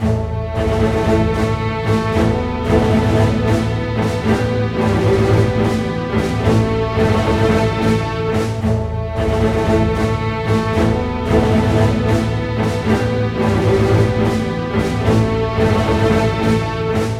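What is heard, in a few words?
112 war, orchestra; East West; Scoring Tools; War Status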